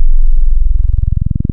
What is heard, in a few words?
Exponential Sine, wavetables; Architecture 32